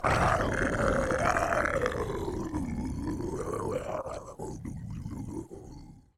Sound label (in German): Monster